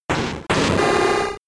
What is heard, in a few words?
Roar